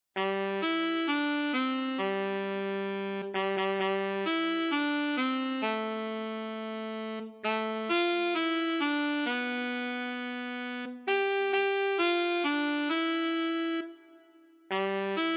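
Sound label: solo Alto Saxophone; Jingle Bells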